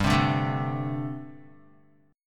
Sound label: G Suspended 2nd Suspended 4th, Guitar; Gsus2sus4 strummed; JGuitar